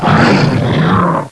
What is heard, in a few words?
scream